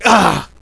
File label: player pain